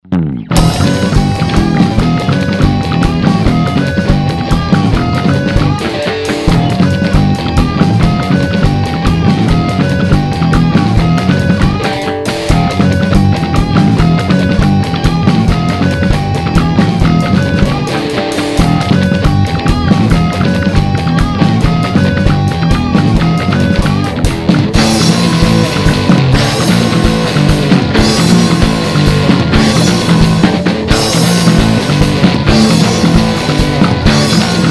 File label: Watershed Bass